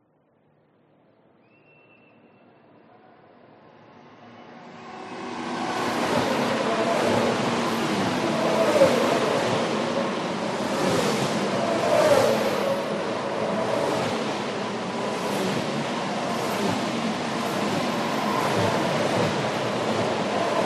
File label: f1 race track pass speed